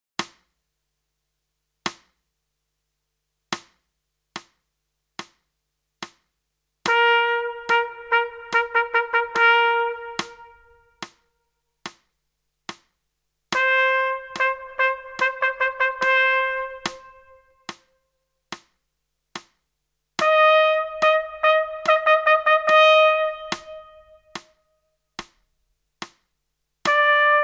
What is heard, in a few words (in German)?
Echo Ubung